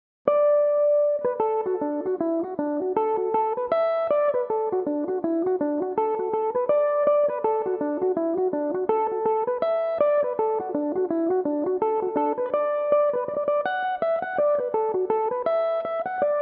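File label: reel D major, Irish mandolin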